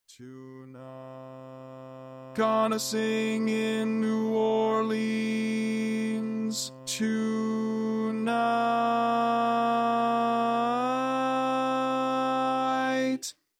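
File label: baritone, barbershop tags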